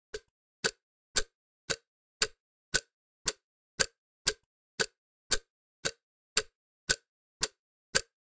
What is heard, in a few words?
Time passing fast clock tick tock